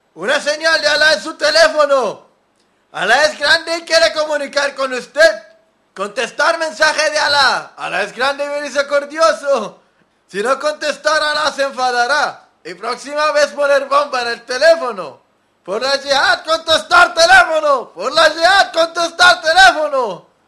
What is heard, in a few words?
Ring Tone Arabe